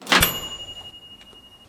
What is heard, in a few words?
register sound sample